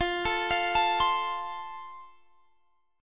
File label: F Major, Guitar Standard; arpeggiated